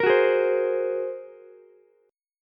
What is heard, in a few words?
A sharp 7th Suspended 2nd Sharp 5th, down, Ukulele; strummed; chord; JGuitar